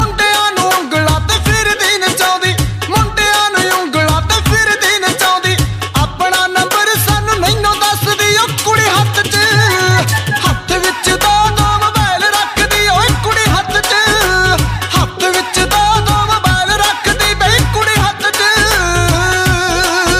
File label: phones marimba